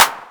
CLAP; osu

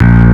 bass; osu

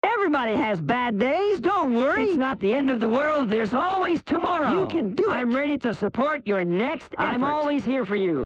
applause

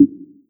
snare; osu

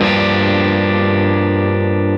Arpeggio down F#m7

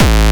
Bass

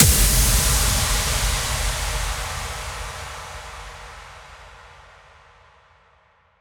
impact

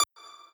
lead d

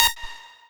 lead

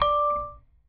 Bell D3

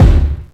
Drum

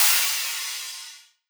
crash; osu

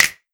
drum hit clap

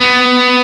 arp; osu

